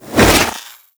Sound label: Melee Whoosh; Warframe; Sentients; Sentient Trooper